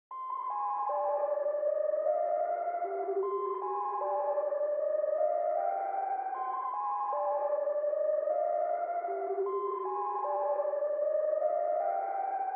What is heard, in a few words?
77 BPM C Min Lead; Cymatics; 2022 Melody USB Expansion; Origin